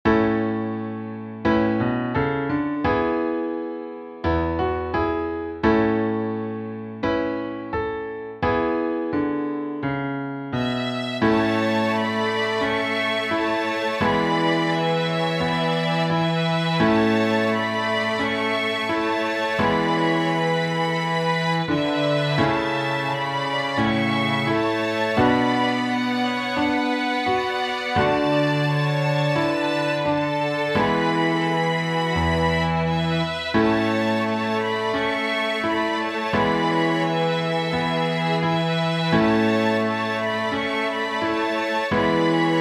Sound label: Piano Cello Violine2 Violine1